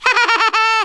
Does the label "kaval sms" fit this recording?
No